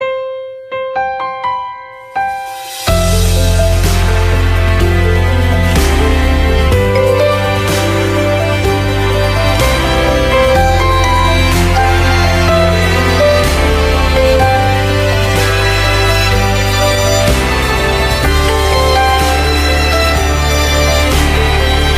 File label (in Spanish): Nuevo tono de Samsung